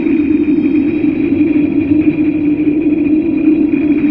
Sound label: machinery; vendetta